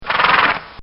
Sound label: Horse Snorts; Animals; Bobby Cole